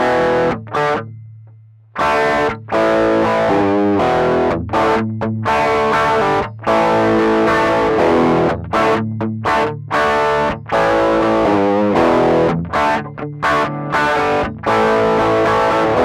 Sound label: bb preamp gain; xotic